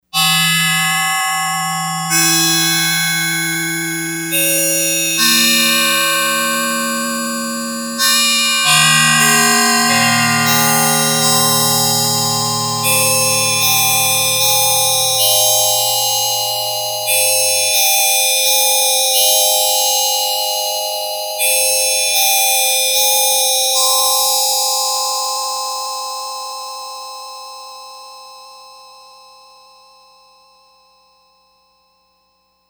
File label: Windbells, Yamaha DX100; Factory Patches